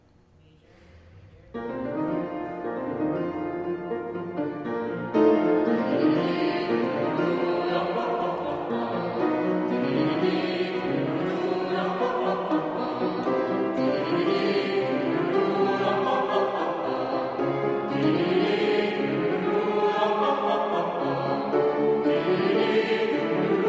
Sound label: warmup di doo da hah hah hah haaa